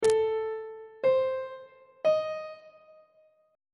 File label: b sharp melodic minor chord 6 is g double sharp diminished on treble clef